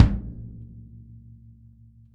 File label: kick, Drum Kits